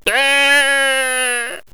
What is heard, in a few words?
sheep die; megaglest